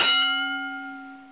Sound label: boxing bell once; pooh; hunny games